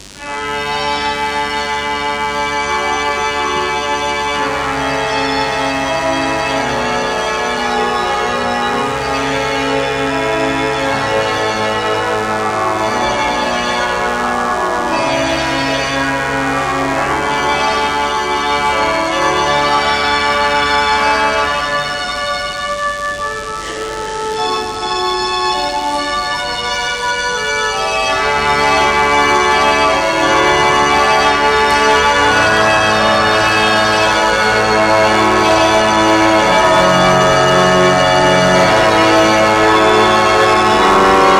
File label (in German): Choralvorspiel D-Dur; Konzert Klassik-AG Historia von der Geburt Jesu (Stiftskirche, Wertheim); Vom Himmel hoch